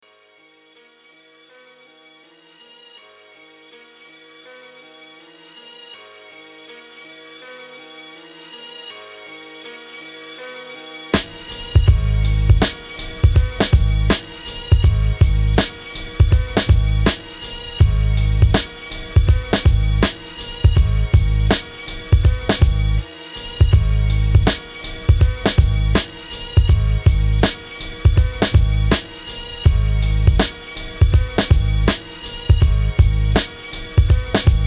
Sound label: big beatz